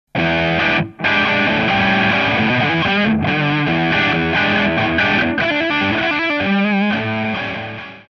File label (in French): crunch; Elle s'obtient naturellement lorsqu'on pousse un peu les lampes l'ampli